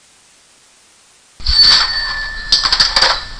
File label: cash